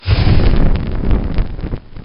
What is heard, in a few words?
SFX BURN